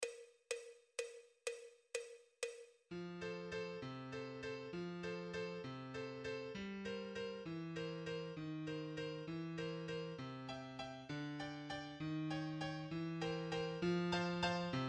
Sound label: Waltz backtrack; Exam Solos